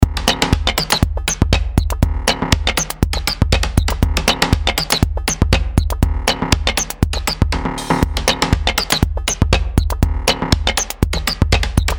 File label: DrumComputer kit; housemeistern